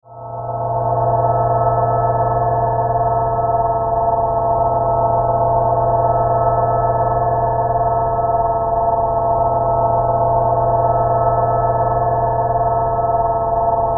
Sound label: Drone Crystal Shimmer Musical Reverb; Blastwave